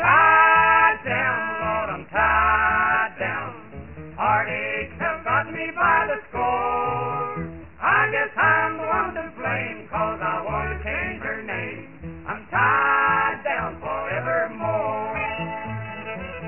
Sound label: BAR MUSIC; duke3d; facility